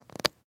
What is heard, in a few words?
Tape; Cherricar